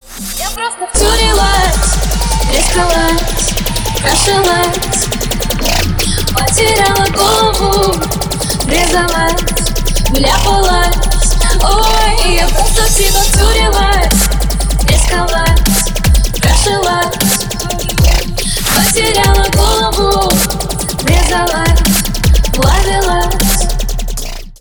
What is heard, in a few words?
piano remix